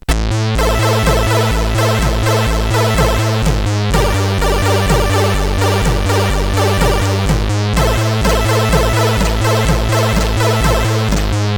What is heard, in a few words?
intro sid CSG8580R5; Monthly News 6